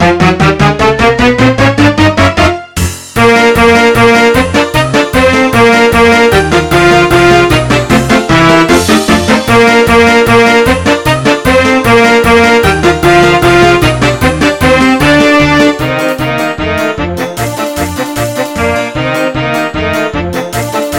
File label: CanCan instrumental